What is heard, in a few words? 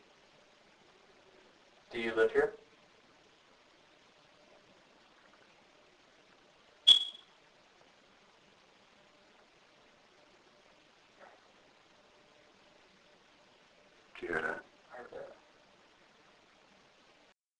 Noise from hall